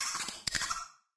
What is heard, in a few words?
Creature Sounds Small Rat; raycaster